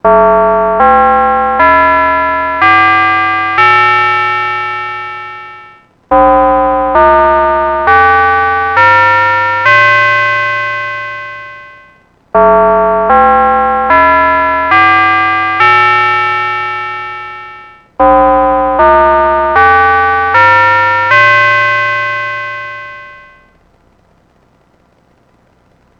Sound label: korg arp odyssey tubular; korg collection arp odyssey test